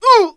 damage; mohaa; dialogue

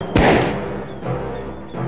death; singe

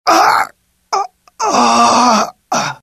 death, pain; mohaa; dialogue

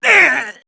damage; mohaa; dialogue

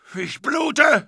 hit, pain; mohaa

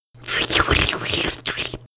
slurp; xash3d fwgs; induction

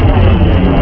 beam load; hurrican

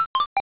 clock; superbroswar

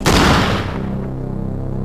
death; singe

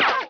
hit; hurrican

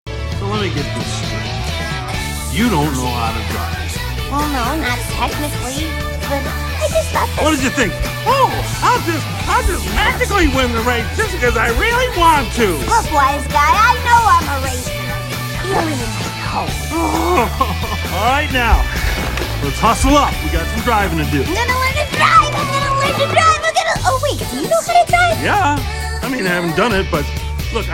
music; singe; Sugar Rush

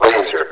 laser; hurrican

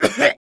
damage; mohaa; dialogue

